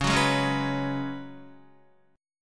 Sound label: Db 7th Suspended 4th Db down Guitar; JGuitar